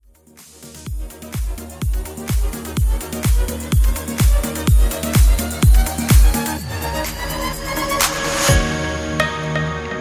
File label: Mashup